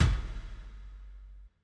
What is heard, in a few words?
Impact; 344 Audio; Epic Impacts Vol. 1